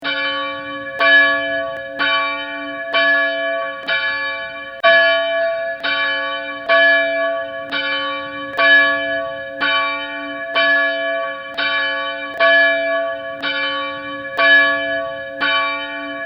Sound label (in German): kapelle